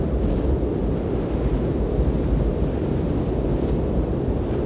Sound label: wind